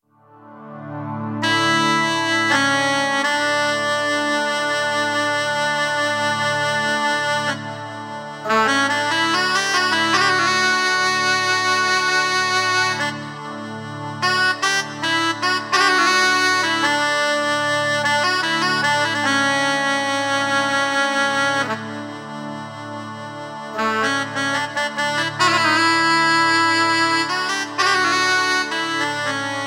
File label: Shehnai